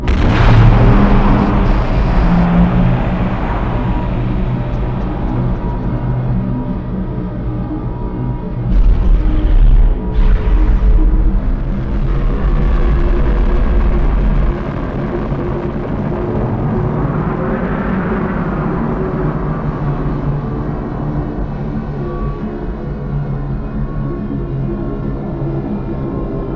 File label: megacarrier leaves; Titanfall 2